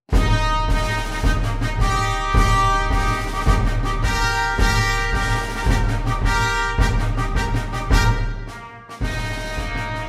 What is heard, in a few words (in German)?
fanfarenecho